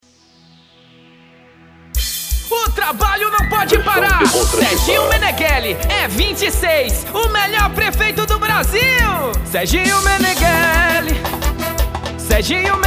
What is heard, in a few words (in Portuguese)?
jingles politicos jingle axe; Jingles Politicos 2020; Serginho Meneguely; Reeleição; Axê Do Melhor Prefeito